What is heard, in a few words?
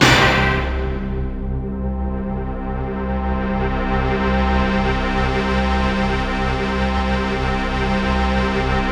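orchestra; Optical Media International; Sonic Images Library; SI FX Vol 18; Instrums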